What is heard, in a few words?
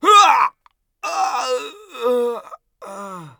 death; Escape from Tarkov